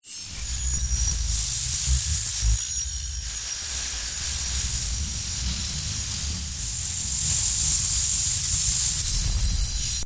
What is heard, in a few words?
Electric, Discharge, Magnetic, Complex, Scifi; 5.1; BlastWave